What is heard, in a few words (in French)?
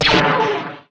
croiseur laser; vaisseaux